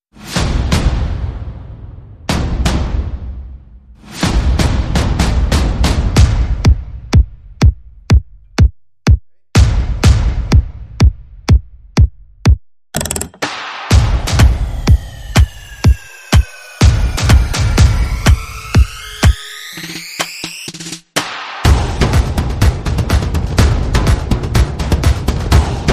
Percussion Vocals and Effects

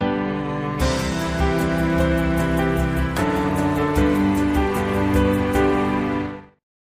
Crowning Achievement, Sting

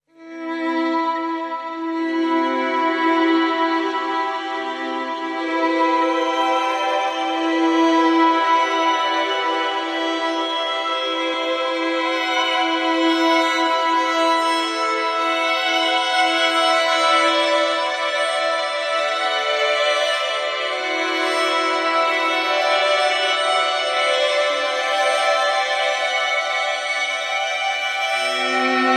Strings

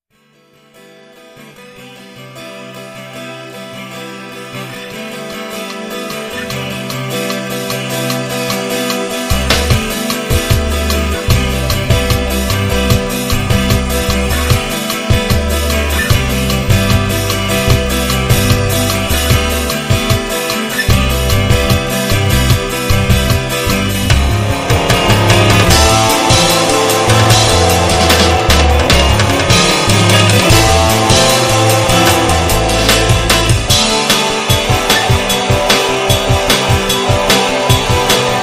Fade In Acoustic

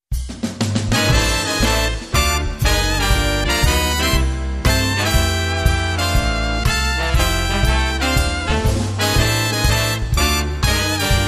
Jazz Brass

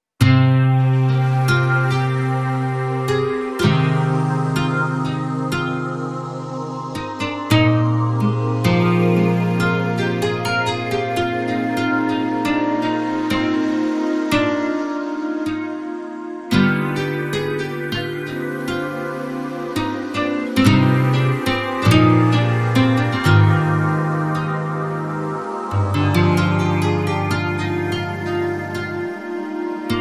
Salamanders Guitar

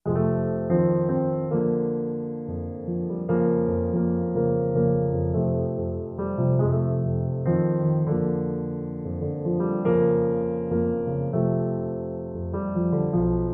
Solo Piano

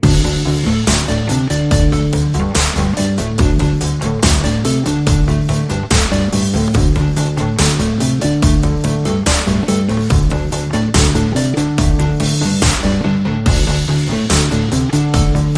Rhythm

